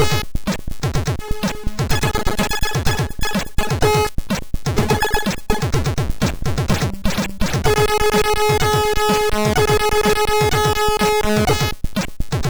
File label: Hurting the Ground sid MOS6581R3